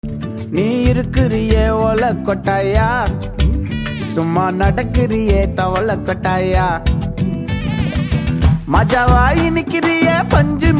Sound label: tamil song; Raayan